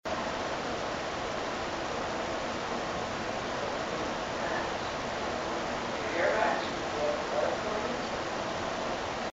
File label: evp unfiltered; evp audio clips gallery 1 our most active location